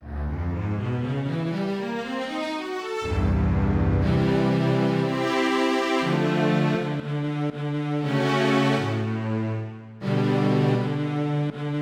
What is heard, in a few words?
Strings; EverQuest